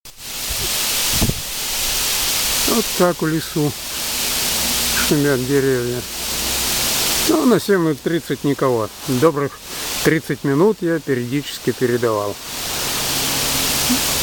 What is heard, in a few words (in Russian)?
Shum lesa